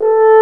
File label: F HORN A3, French Horn